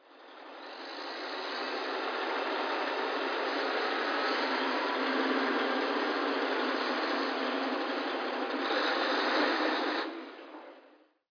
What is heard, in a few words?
truck drive; ambient